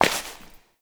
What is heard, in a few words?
Step Ice; Warframe; Koumei